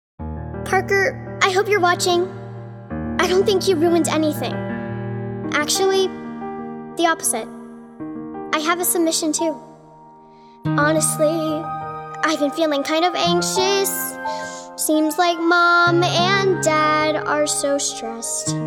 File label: VOCAL